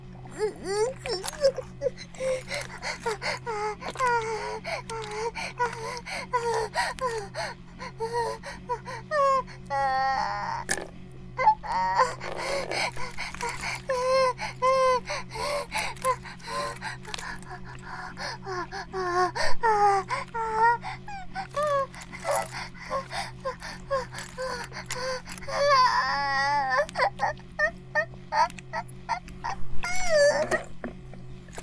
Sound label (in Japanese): バイブ放置; イクたびにわけて